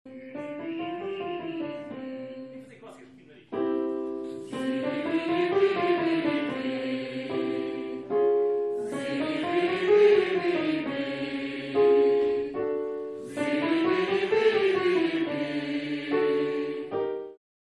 aquecimento vozes

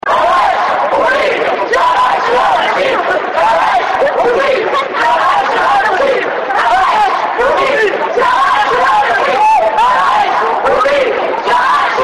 som manif

